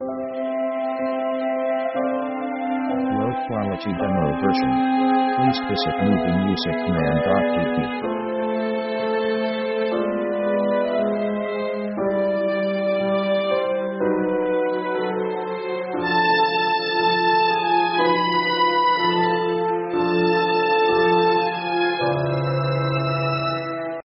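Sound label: orchestral melo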